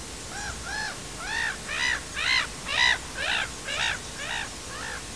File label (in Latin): larus ridibundus